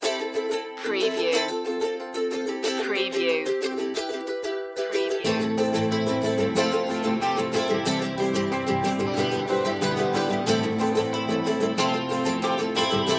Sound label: open door